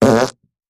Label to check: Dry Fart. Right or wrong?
Right